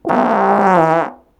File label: fart dry trumpet